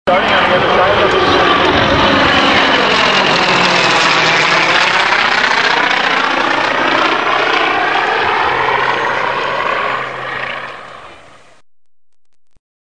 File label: S-2 Fast Fly